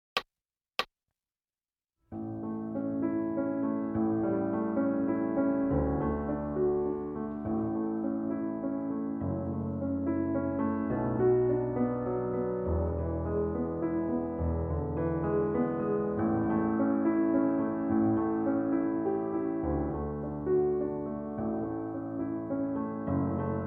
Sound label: Piano, Amazing Grace